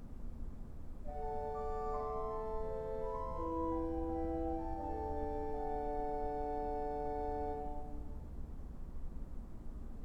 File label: nachthorn, organs